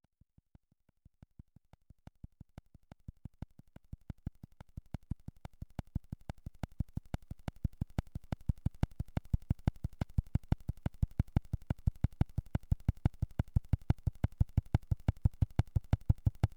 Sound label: spectrum envelope